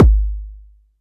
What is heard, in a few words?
BD